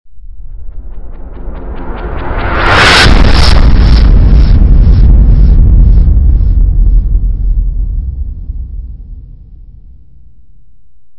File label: Stinger; STINGERS